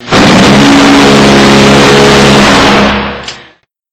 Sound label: GAU-8A Avenger Gatling Cannon